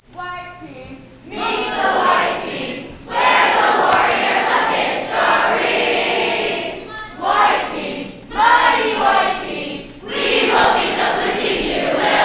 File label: Cheer